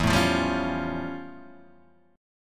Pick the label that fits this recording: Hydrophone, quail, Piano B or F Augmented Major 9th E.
F Augmented Major 9th E